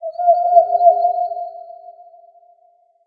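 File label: storm rain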